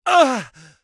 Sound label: Male Fight Death; Mighty Party